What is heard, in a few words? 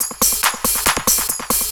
hard beats 140, hard trance beats